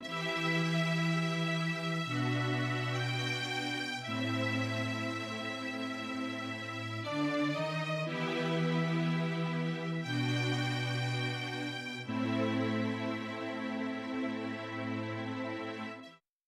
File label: The string loop; 迪斯科; 如何制作迪斯科（disco）音乐